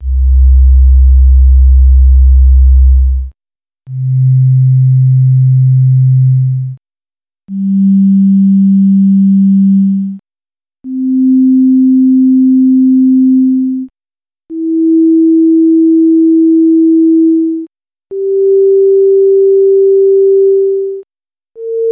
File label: Harmonics Sine